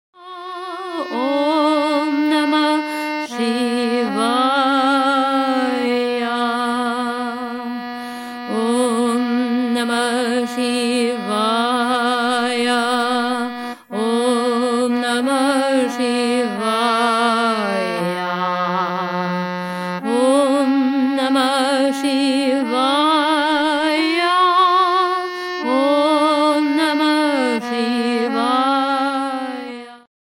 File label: Om Namah Sivaya